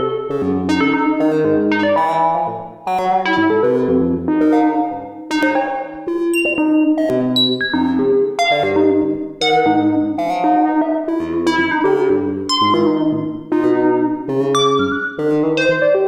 Odd Beats

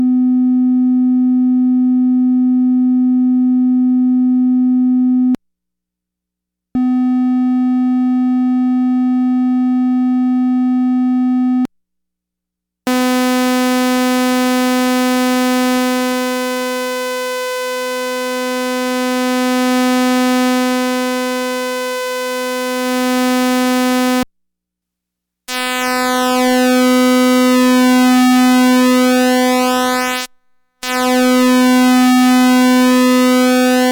Waveforms, synth

